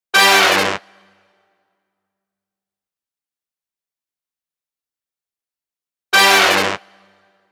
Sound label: Orchestral Hit